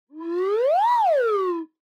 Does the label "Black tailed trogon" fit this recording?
No